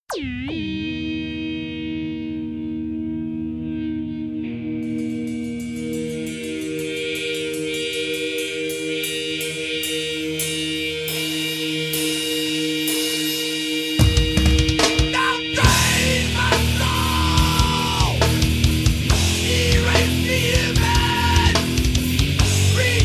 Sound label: Filter